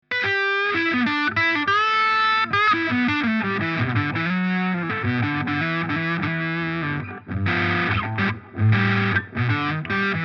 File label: stacked Gain; VS Audio Straight Flush